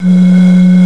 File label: E2, BLOW BOTTLE; AKAI S6000 CD-ROM - Volume 1; BrassWind